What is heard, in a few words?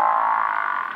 buffalo; Sounds of the Buffalo; From CD 'Animal Calls of Africa'